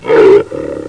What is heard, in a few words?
tiger growl; PUZMANIA